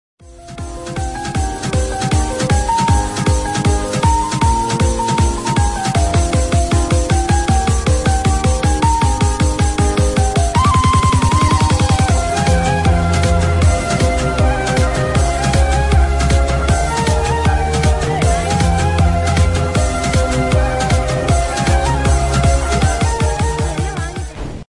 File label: BGM Remix - Instrumental Ringtone